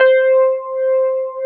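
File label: Wurlitzer C5, Keyboards Old School; USB Soundscan vol.09; AKAI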